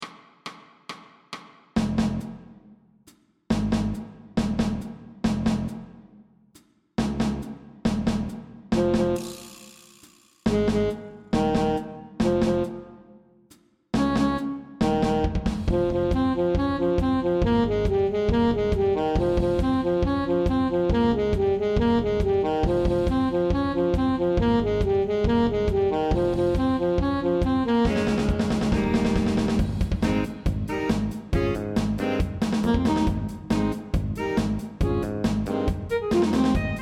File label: abridg solo